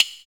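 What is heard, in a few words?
PER PROCU, Procussion, percussion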